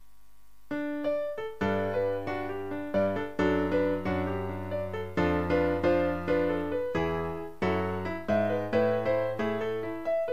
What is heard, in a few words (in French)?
accords; accords parfaits